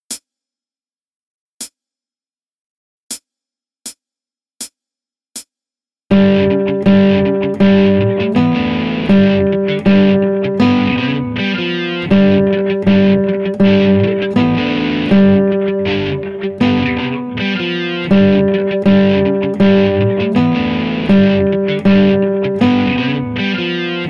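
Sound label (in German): Saitenwechselübung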